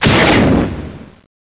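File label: Explosion Small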